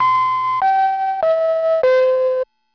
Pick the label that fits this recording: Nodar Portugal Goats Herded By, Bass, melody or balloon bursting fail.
melody